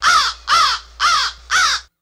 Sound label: Crow Caw Classic X4